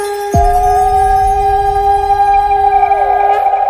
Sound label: BGM Notification; Laxmi